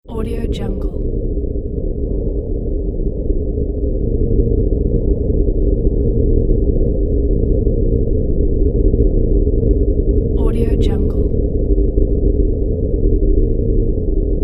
submarine ambience

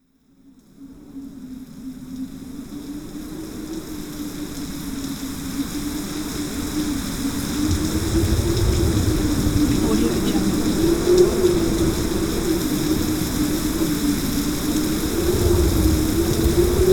spooky wind rainstorm with thunder

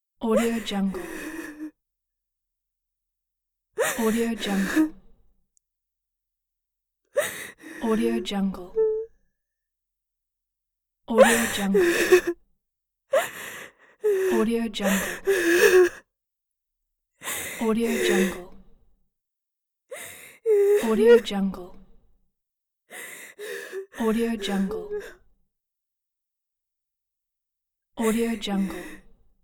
woman crying soft sobs

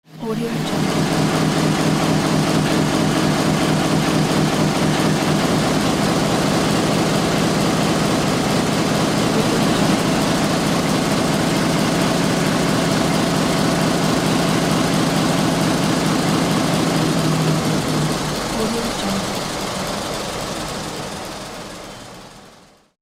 diesel engine

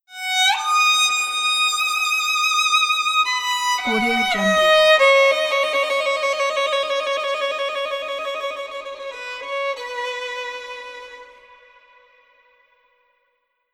classical violin phrases

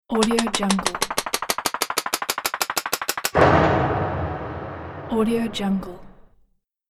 cartoon time bomb explosion

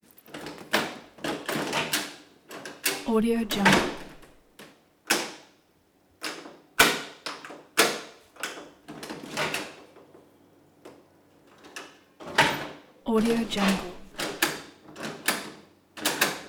wood and glass door

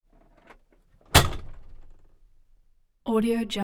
mack truck

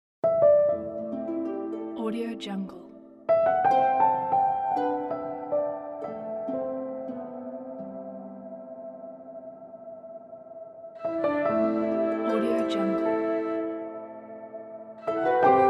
fantasy world

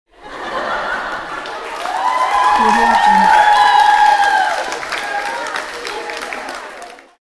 crowd claping laughing and screaming

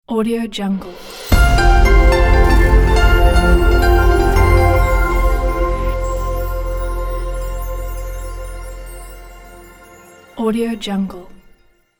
piano logo

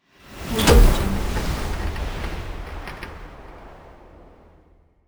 dark snap impact

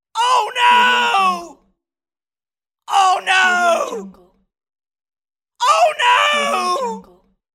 man screaming oh no

